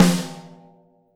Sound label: KIT SD, Drums Acoustic; USB Soundscan vol.10; AKAI; 90s sample CD; ALL SD